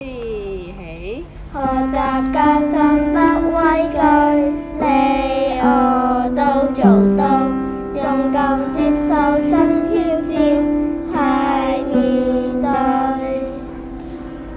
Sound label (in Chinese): 舊曲新詞